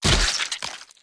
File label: gib; edgar